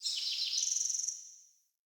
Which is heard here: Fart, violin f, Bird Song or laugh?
Bird Song